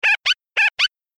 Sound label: comical cute